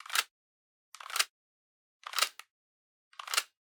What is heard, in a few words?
Generic Click Plastic Small; boom library; The Interface